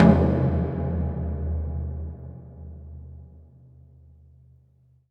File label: Big Hit, impact drum hits; Sonic Foundry (Sony Creative Software); The Electronic Music Manuscript Collection